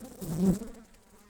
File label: Humilis workers, bumblebees